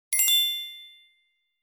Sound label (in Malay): Kesan Bunyi Betul